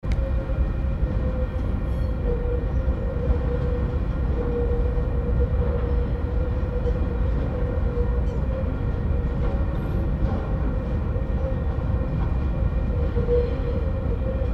train port chalmers

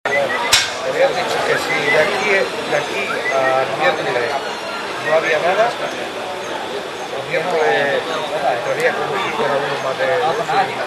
chaos street recording, south east asia

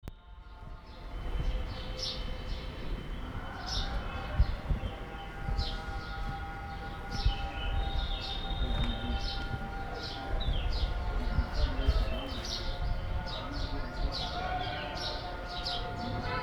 Ambient morning, North India

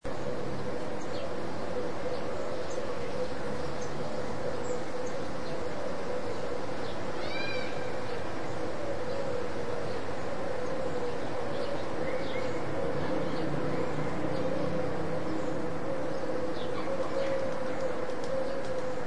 woods ambience

